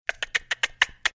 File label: tongue clicking